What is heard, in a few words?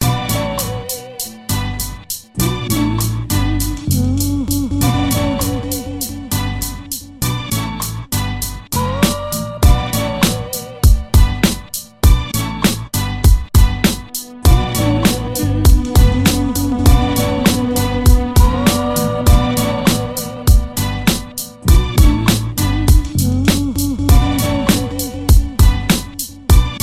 beat